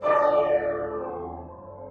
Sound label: SYNTH PAD ETHNO 125 A, Sphere